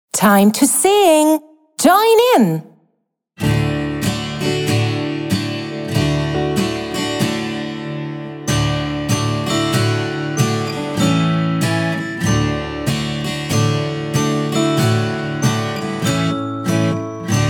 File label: song my yummy snack karaoke; englisch; ich und du; Schuelerzeitschriften